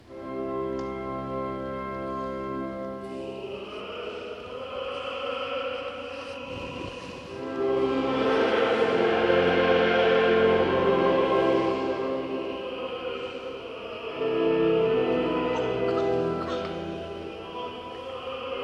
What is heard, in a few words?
Offertory motet